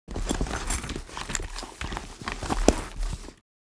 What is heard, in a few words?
Searching clothes in leather handbag